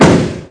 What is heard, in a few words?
Door Slam Door Slamming; Power Drill Massacre